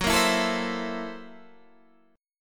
F sharp 7th Sharp 9th Flat 5th F sharp, Guitar, DADGAD